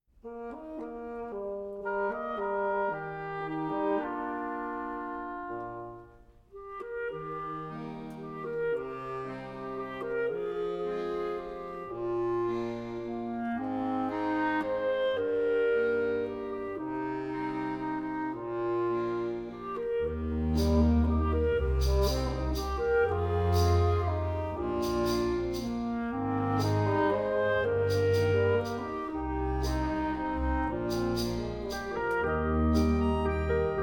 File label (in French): orchestre seul